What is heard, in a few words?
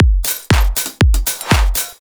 beat